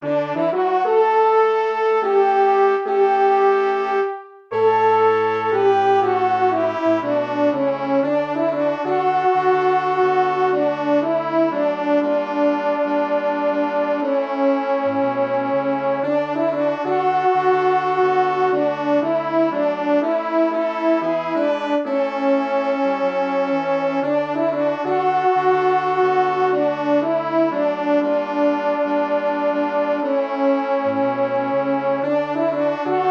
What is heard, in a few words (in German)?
Alt 120bpm